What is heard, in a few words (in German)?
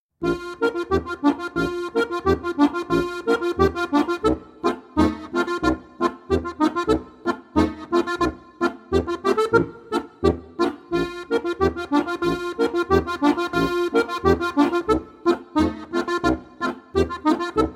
hackbrett polka